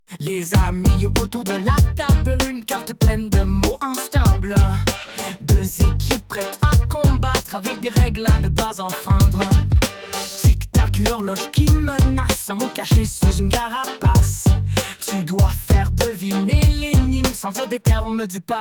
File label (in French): musique jeu